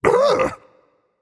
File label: surprised, voicepack; smite; jorm